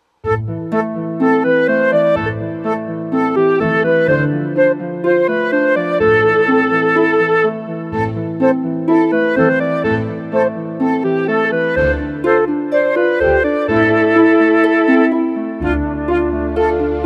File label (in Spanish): ARBOL DE FUEGO PARA FLAUTA